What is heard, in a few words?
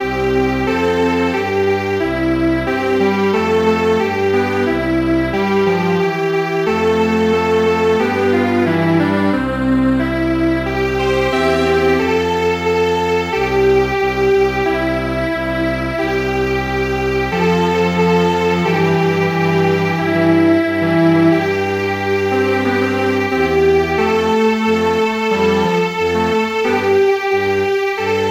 whirling Strings Quartet